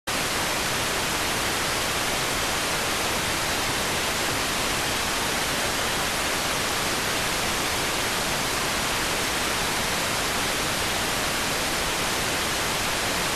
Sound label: rtty rx